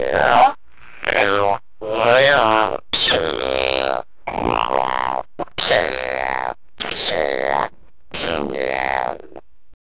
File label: very bad phonk